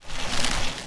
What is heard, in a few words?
bike move; CosmicRage